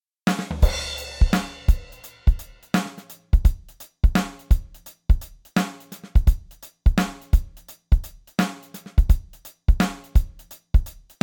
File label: Groove